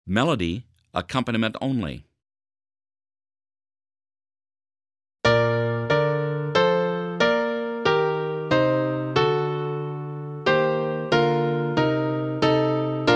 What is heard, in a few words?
trumpet melody piano only